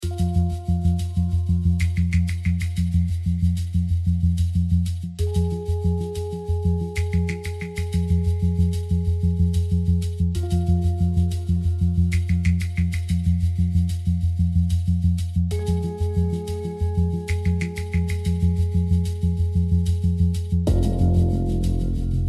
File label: Searching Suspense